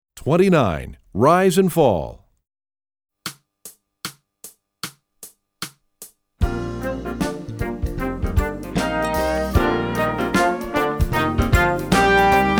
Rise And Fall, trombone; essential elements

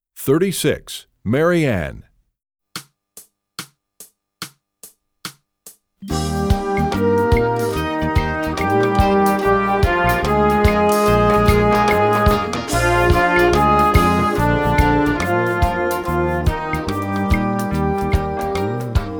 trombone; essential elements

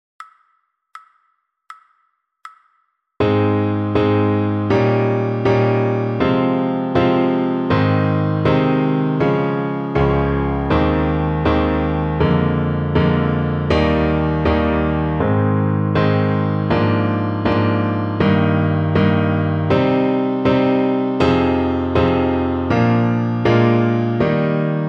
piano

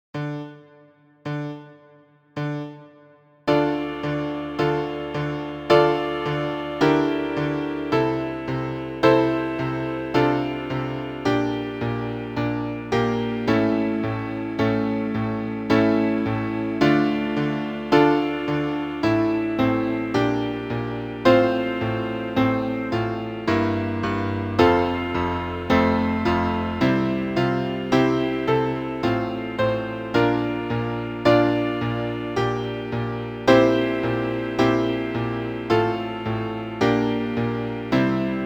Grave piano

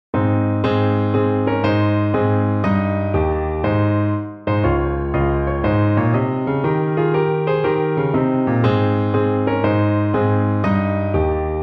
piano

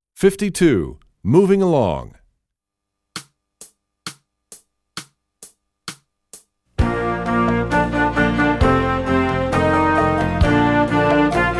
trombone; essential elements

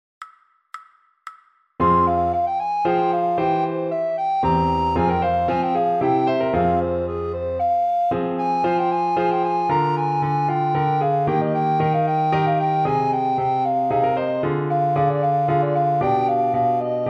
recorder; Telemann

